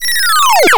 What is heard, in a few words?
Downgrade; Berry-Dash